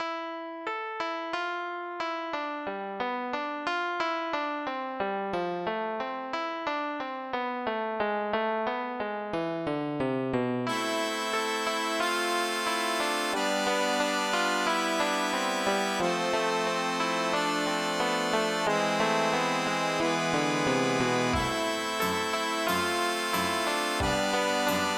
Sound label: block end; 100gamesandmore1995